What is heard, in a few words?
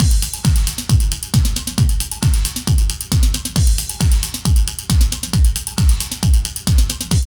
LOOP, Tekno Industrial; East West